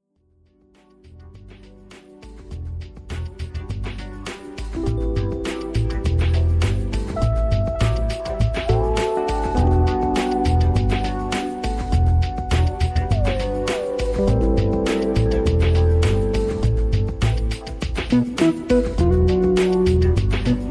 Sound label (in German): intromusik mono